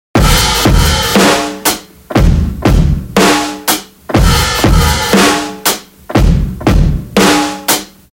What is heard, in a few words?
120 dirt loop dirty mix, drum; dirt vol 2